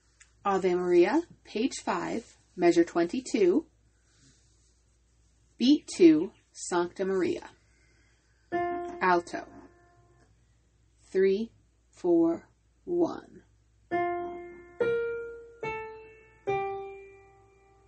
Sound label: biebl ave a